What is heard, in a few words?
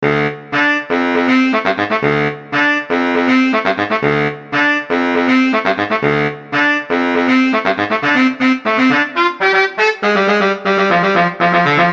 Sax A Boom